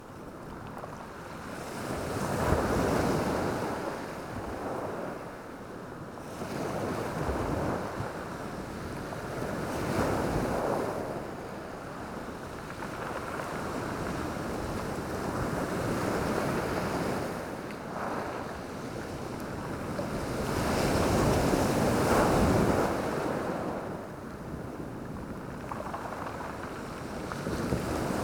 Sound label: Water Surf With Rock, water sounds; WATR Wave